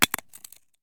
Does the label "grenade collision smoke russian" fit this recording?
Yes